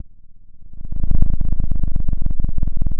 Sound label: pop, Sound of a cat purring